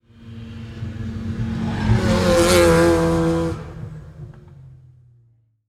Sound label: Transportation Car Race Car Drive By